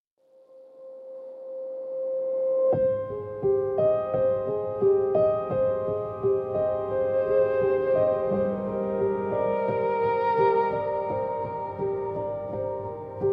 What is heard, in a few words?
spiritual spooky soundscapes